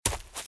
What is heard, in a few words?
Footsteps - Leaves - Run - Left; RadwinIsland